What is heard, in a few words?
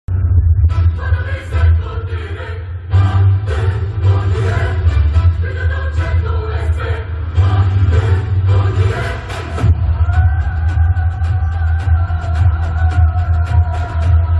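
climax background music ringtone; bharateeyudu2 movie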